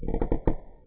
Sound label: SQUEAK; cs mansion dk